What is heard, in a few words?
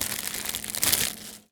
Foley